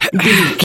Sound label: voice male effort short